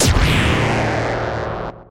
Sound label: fire beam